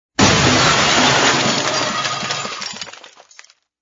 glass smash

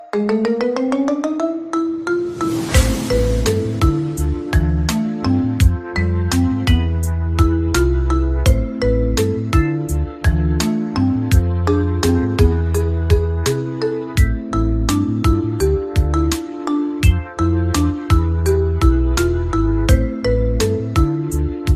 Marimba Ringtone Remix Ringtone

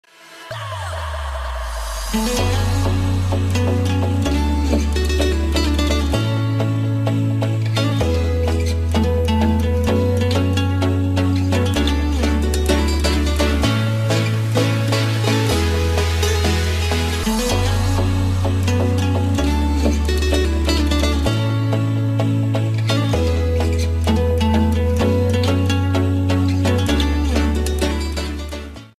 Spanish House Guitar

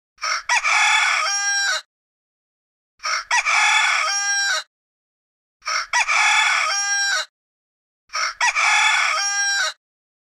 Rooster Alarm

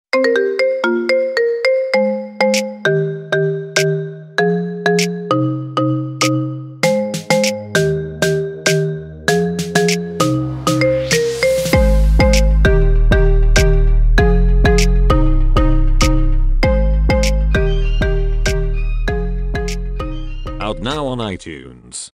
Marimba

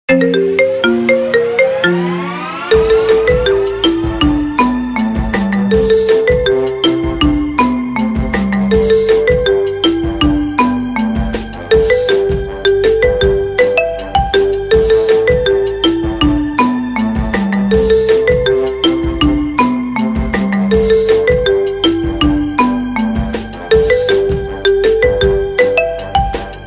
Marimba Remix

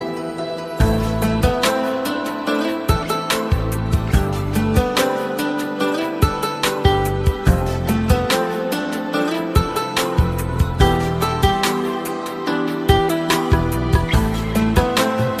Guitar Haunted 3D

